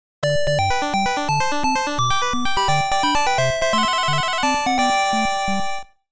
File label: Start; Game Sound Museum Namcot; Family Series; French Open Start